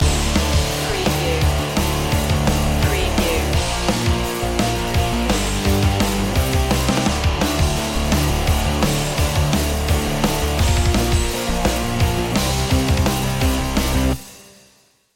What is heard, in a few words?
8bit rocktronic underscore